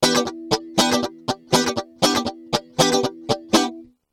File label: rhygtra stereo clone theory